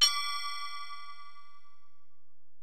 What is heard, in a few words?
Bell, Alesis DM-PRO